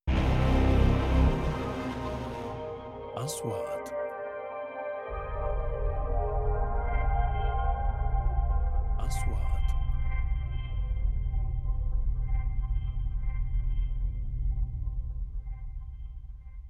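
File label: 3D SFX Trailer; مؤثرات تشويقية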